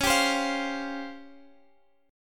Db Major 7th Flat 5th Db down Guitar Half Step Down; chord shape